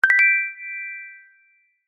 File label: notification alert prompt bright chime ping; zapsplat